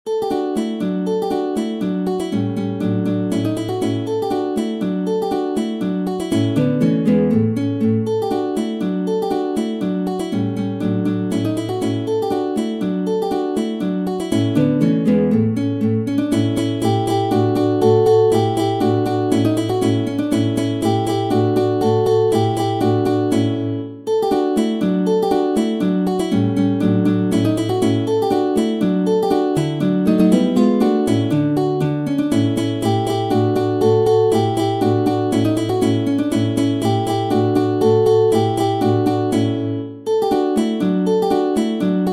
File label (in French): Allegro Grazioso, Méthode pour la guitare; Ferdinando Carulli; Op. 241; Classical guitar